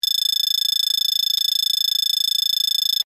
Scanner Pulsing Dull, drones

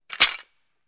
Metal Animal Traps

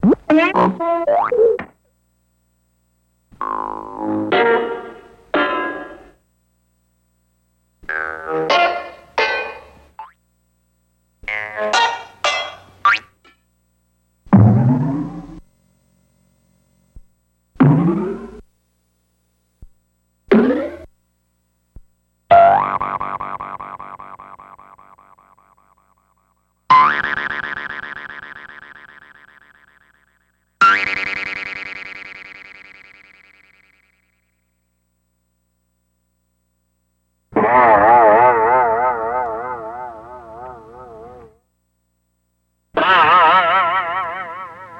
Boinks Percussion

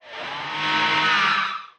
Music E Guitar Weird

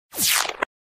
Laser Fire Wash

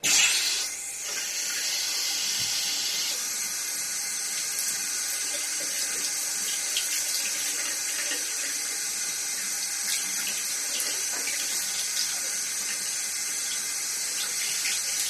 Sink Faucet, W Squeaky Water On Off.

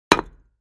Patio Block Hit Sledg, concrete & brick

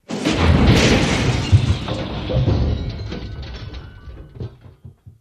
Metal Debris Droppe

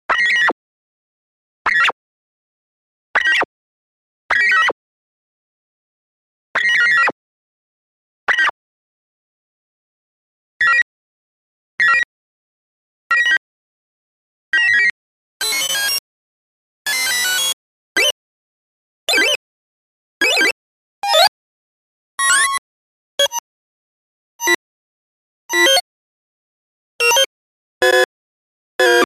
Bursts Small Notes Sm

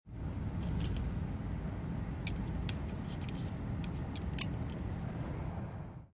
Seat Belt Buckles On A, Interior Accessories

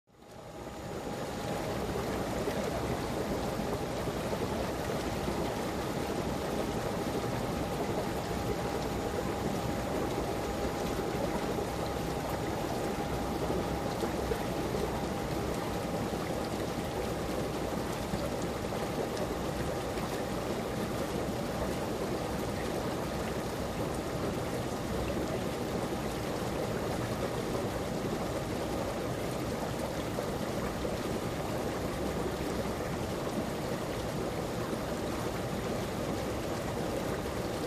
Jacuzzi Bubbling, bubbles

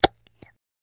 Ketchup Bottle, Gushy Sound, Bottle Suck

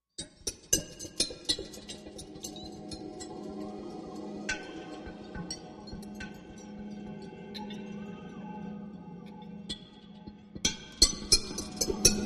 Ice Fall Ambient Ice Cracking Distant Wind Cries; power core hum

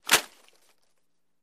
Plant Tear Rip; hits & impacts

